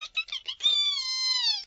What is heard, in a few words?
rabbit long howl